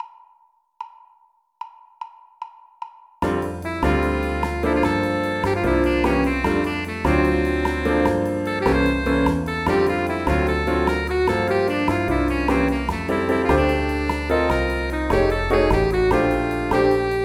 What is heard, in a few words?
4 horns, Alto Solo with Rhythm; Combo Charts